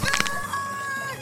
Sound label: ahhhh